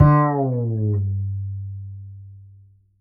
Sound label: Upright Acoustic Bass, 1ST POSITION; Larry Seyer